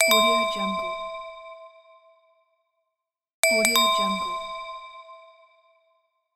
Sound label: light bulb